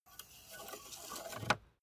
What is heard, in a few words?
car bmw e46 exterior oil rod insert